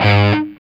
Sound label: SOLDANO ROCK, Guitars